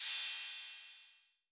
Cym B 808 Decay A

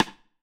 Rim Acoustic Normie Verb

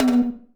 Tom Bounce Hi Echo

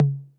Tom Mid 808 Color

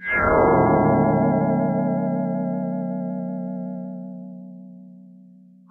SYNTH; Stetlz Moodz Power

